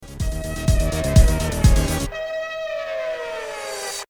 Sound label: Siren